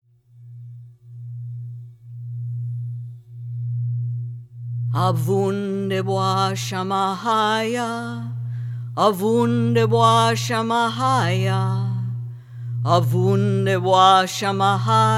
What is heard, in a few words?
The Lords Prayer Sung